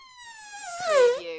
CARTOON FUNNY AIR RELEASE

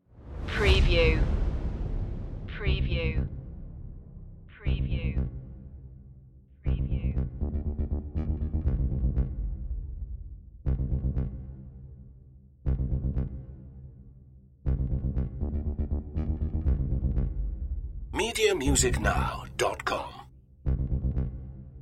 effects only

